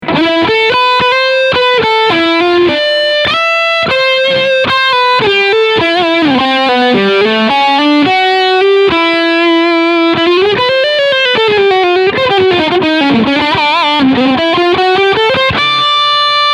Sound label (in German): Steg PU Overdrive Lead